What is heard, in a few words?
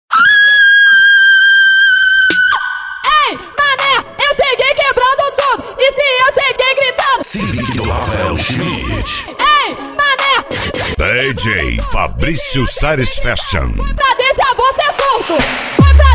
funk mega bass